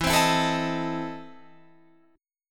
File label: E Major 7th Suspended 4th Sharp 5th E, Guitar, Open F; 16,19,16,16,18,16; strummed